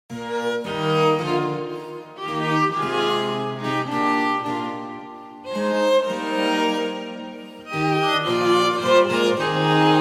Ich möchte ein Lied versuchen STRINGS; Schöpfung

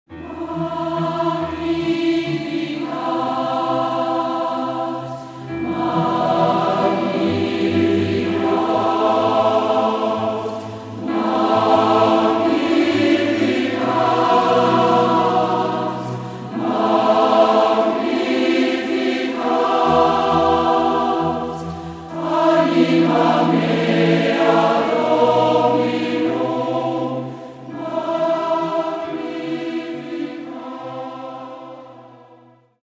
Magnifikat CHOR

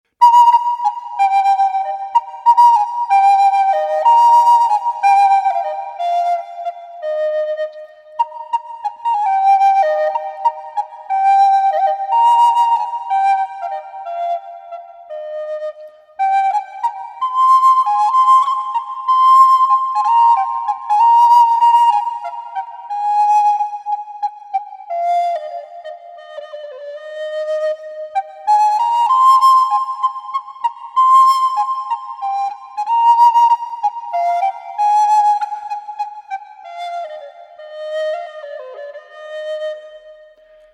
Dich rühmt der Morgen - FLÖTE; Lied

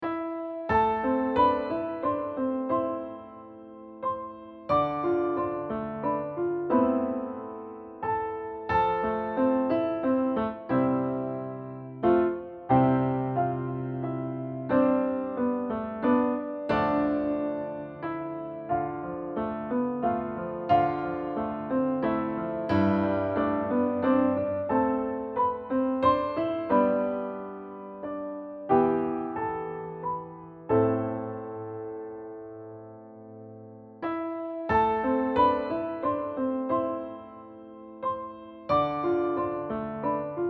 Ich traue Gott - Psalm 23 INSTR

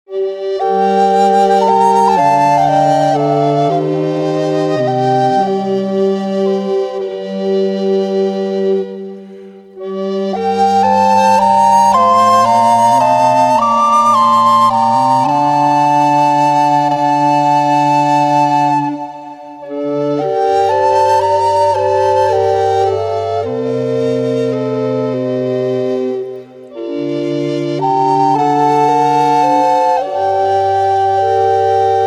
Du Stern des Abends FLÖTEN; Halleluja; Hymnus

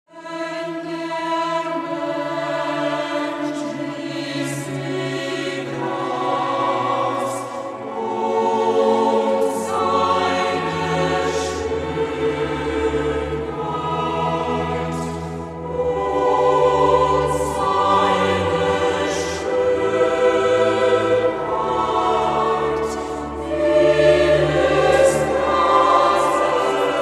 CHOR

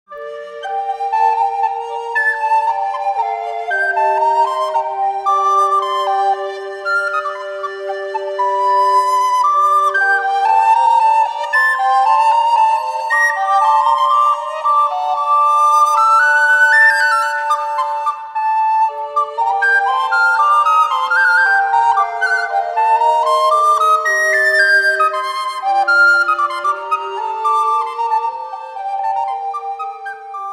FLÖTEN

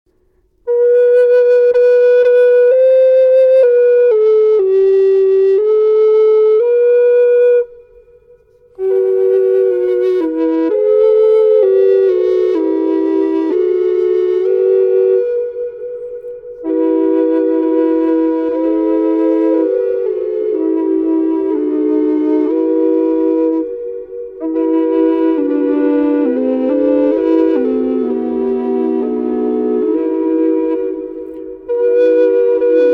Gott segne uns und behüte FLÖTE

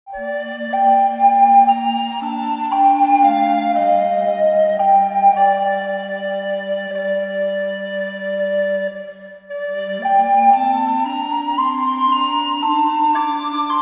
Du Trost der Armen FLÖTEN; Hymnus

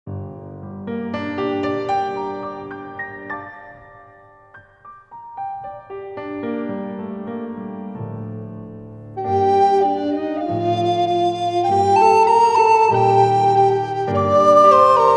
FLÖTE

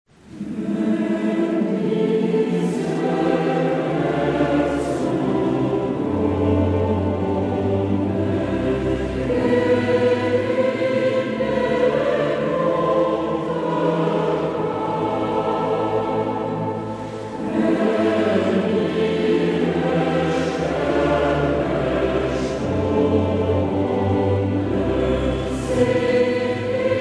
CHOR; Passion; Lied

